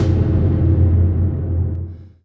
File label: levelfail